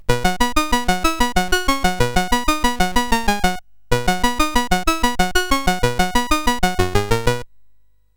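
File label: Moon Patrol, MOS 8580 SID chip music, games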